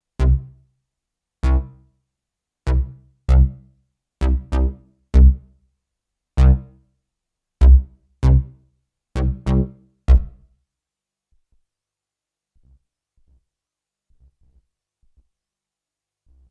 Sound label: volca bass micro synth